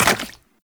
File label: Run Goo, Footsteps; Warframe; Characters; Tenno